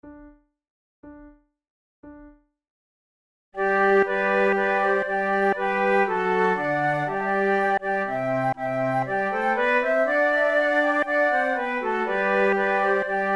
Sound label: te deum choir, flute; charpentier